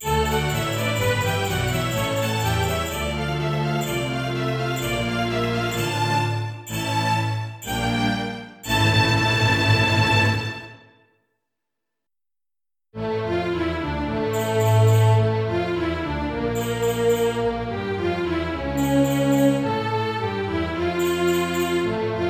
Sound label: Jingle Bells Gone Wrong, orchestra; Christmas